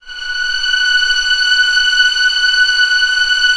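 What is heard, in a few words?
strings F#4 PP R; Sound On Sound - Peter Siedleczek's Orchestra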